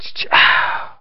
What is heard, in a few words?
chi chi ah, Voice ooh ahh